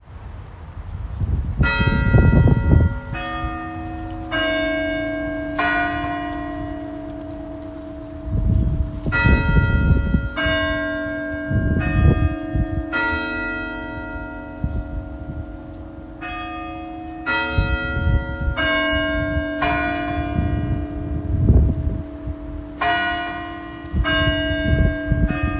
ezhechasnij boj kolokola v kolledzhe; mills

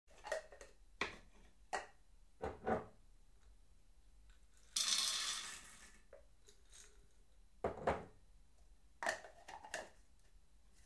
nasipayut zerna v elektricheskuyu kofemolku melyat

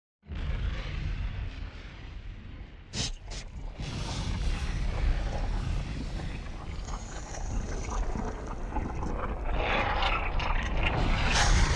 vnutri vulkana bulkane lavi

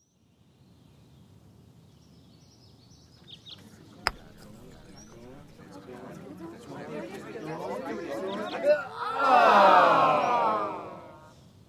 golf udar po myachu nedovolnie vozglasi nebolshoj tolpi

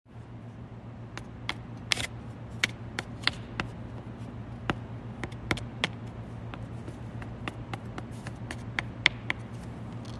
trevozhno postukivaet karta

